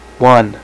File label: Male; NUMBERS